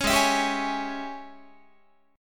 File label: Ab Major 7th Suspended 4th Sharp 5th Ab down Guitar; AbM7sus4#5 strummed; 16,16,19,17,17,19